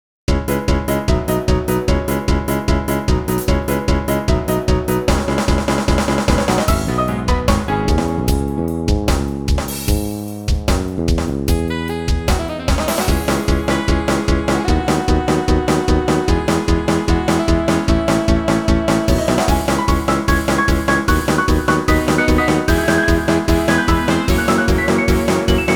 RPG boss; Battle

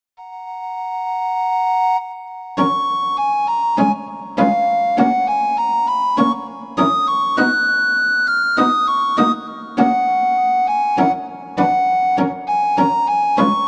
RPG village; City

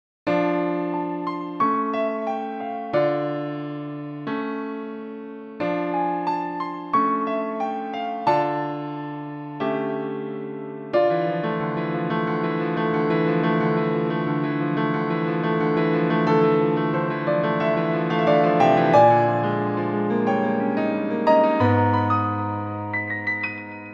RPG evil; Event

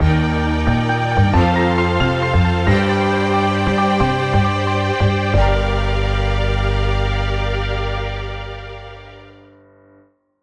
RPG gameover

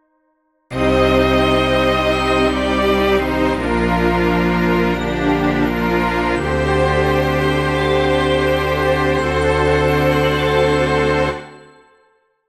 RPG gameover

